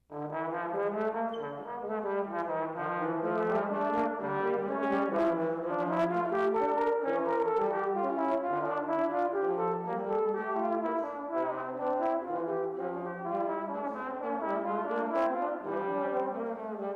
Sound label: trombone; Trombone Duets; Studio Projects B1-->Behringer MIC100-->Yamaha MG10/2-->CL Audigy-->Adobe Audition 1.0